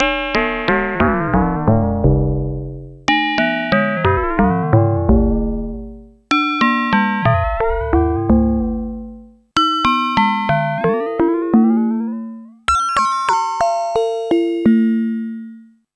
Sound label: Groove Synthesis 3rd Filter FM Analog